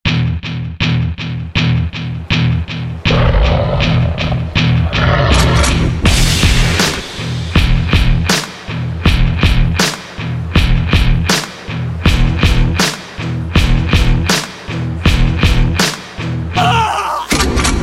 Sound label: punjabi ringtone